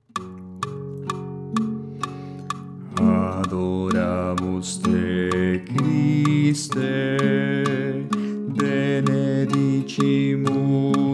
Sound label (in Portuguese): Baixo; Adoramus te Christe